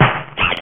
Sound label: slap